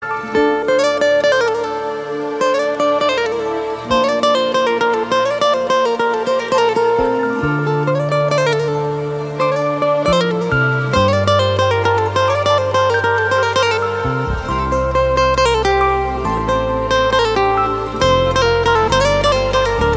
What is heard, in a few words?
Persian MUSIC